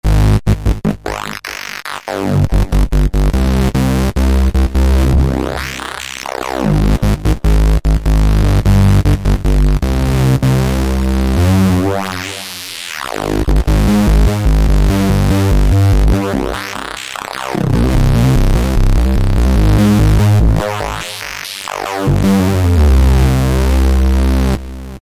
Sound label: HPF Sweep Bass, Korg microKORG; Factory Voices